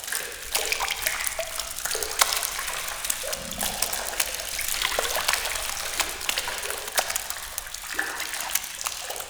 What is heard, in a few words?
Interior Goop Drip Loop; Warframe; Infested Eris; Ambience; Props Indoor